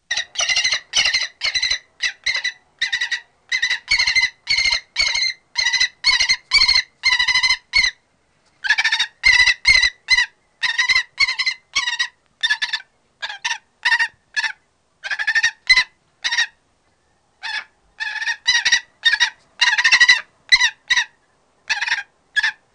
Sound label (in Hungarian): Kerecsensуlyom; Madarak